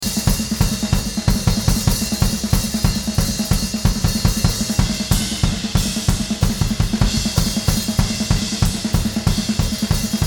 Drums

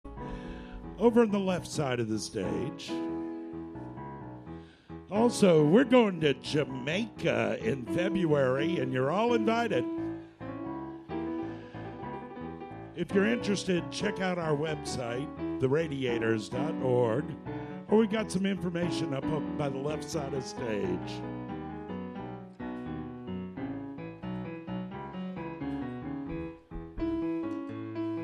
tuning

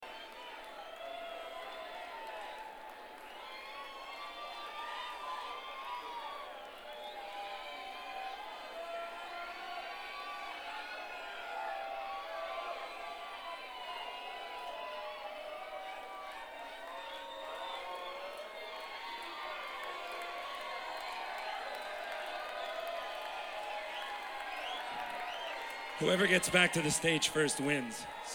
Applause

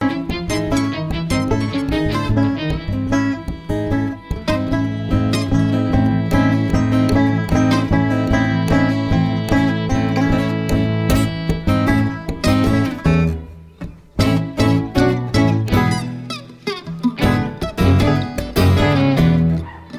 Polka On A Banjo

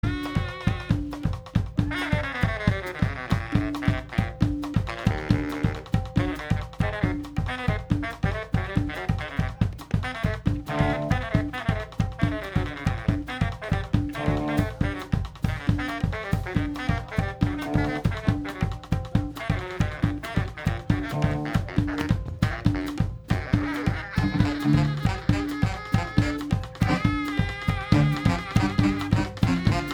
Bhangra

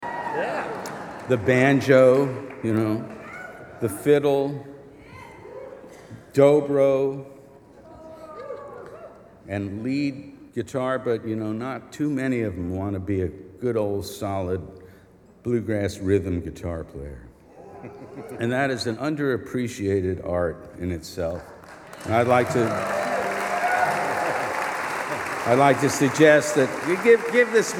Bluegrass Rhythm

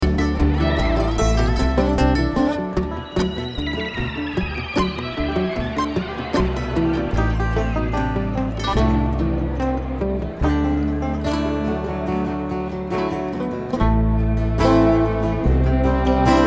Traffic Jam

